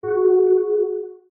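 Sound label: notification message; Stellaris; ringtone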